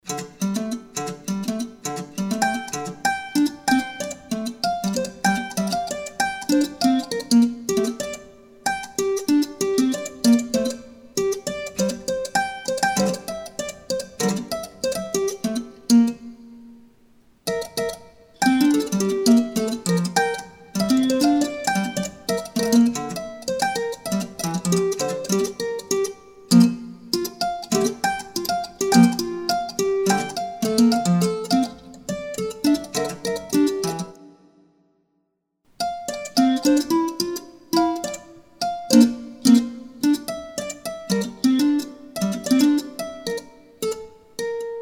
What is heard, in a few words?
Minimal Clavier